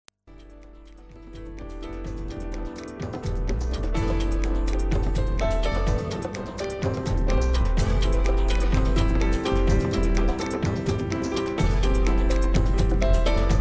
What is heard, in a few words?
cello chill sounds